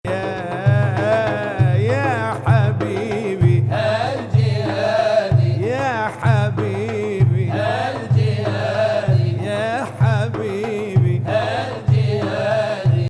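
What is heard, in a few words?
Ajam Saba 6-8, Arabic music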